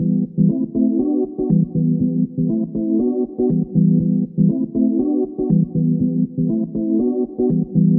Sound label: mellow minor synth bounce 5ths, synth and electronic loops